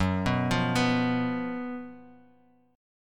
F sharp Major 7th Suspended 4th F sharp, Guitar Open F; F#M7sus4 arpeggiated; 6,6,5,6,x,x; chord shape